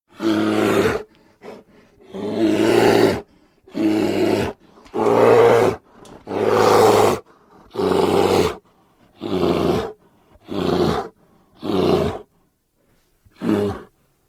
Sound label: grizzly